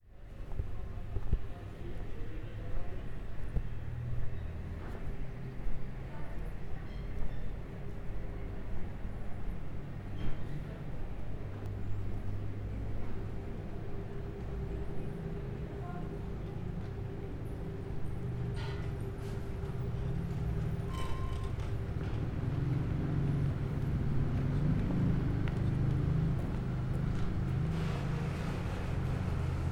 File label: Quiet Street